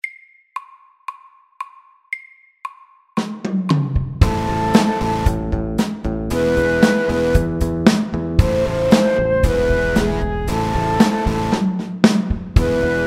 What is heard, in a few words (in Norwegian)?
Elektrisk rock